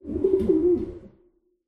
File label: amb nature pigeons